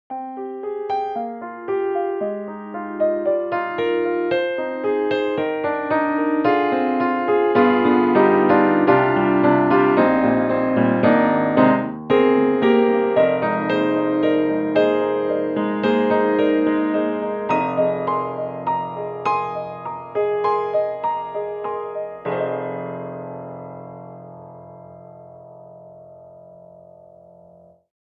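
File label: Vertikal Jazz, piano; VI ONE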